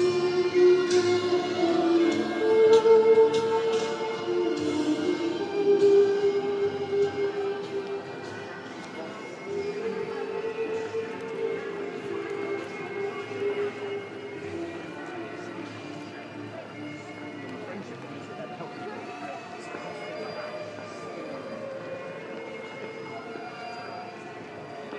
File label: panpipes bagpipes and bells